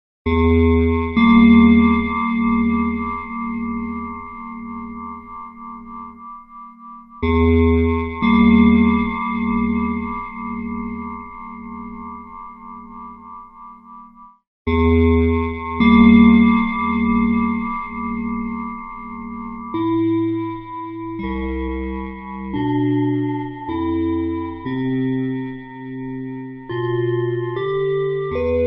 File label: Bell, Baby Piano